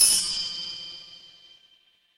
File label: instrument bell